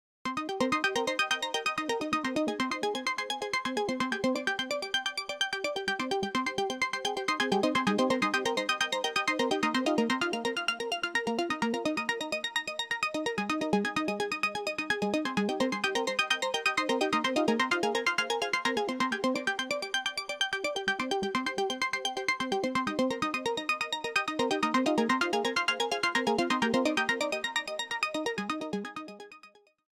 Arp Synth; Ultra Analog

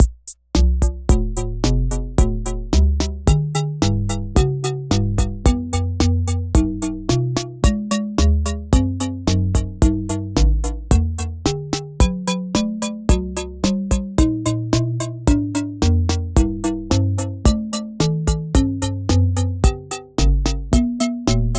slow rod harmonies; richard devine signature; aas; objeq delay

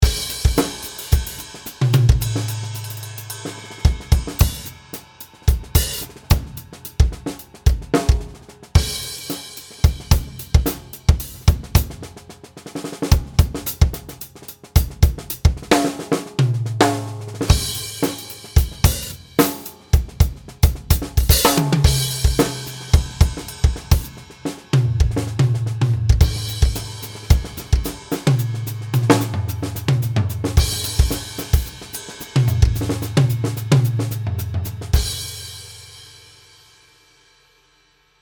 Heavy Metal; BFD3 Preset

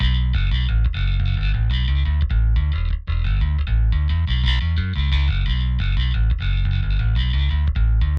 Bass DI 1073LBEQ After; Neve 1073LBEQ使用後

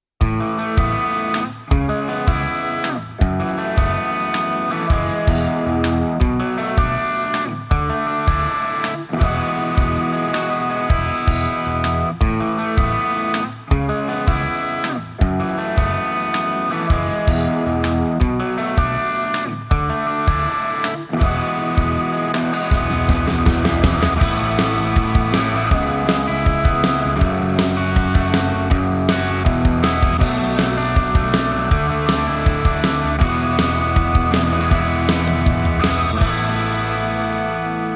Crunch Guitars Off

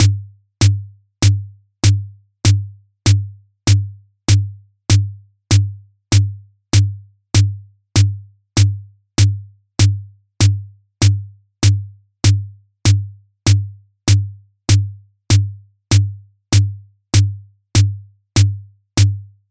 delay snares cr78 body wet; aas; objeq delay

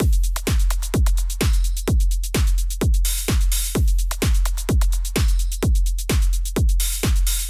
drum; neutron

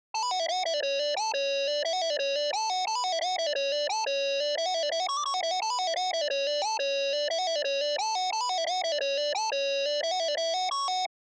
Synth Lead Before; neve

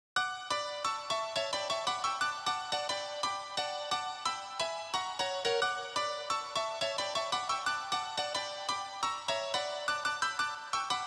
Piano Before; neve

